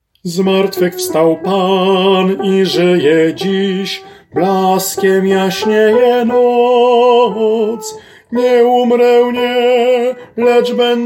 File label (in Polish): TENOR; Zmartwychwstal Pan